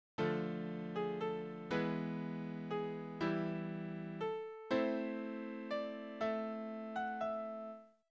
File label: bad music fixed